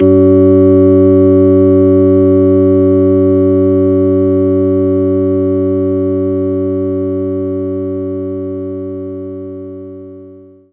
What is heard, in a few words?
Hammond Organ; knights try